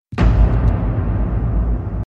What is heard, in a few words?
Eco Blast